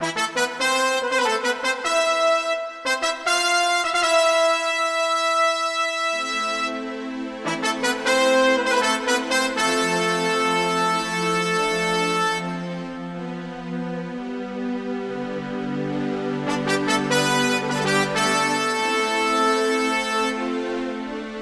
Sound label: note split